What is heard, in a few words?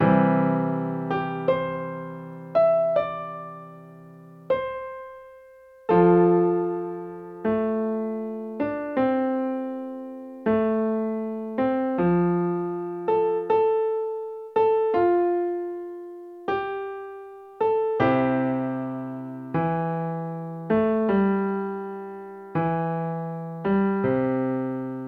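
practice blue grass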